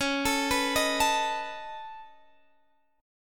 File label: Db 7th Suspended 2nd Sharp 5th Db down, chord shape; Db7sus2#5 arpeggiated; JGuitar